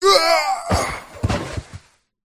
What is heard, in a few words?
human male death